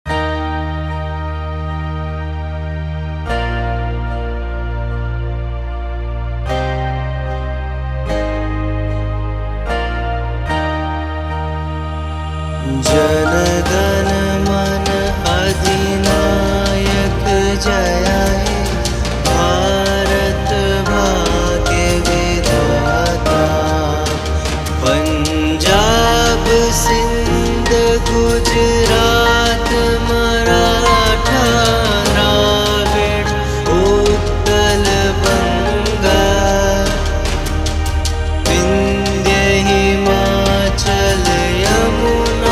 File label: Acoustics National Anthem Cover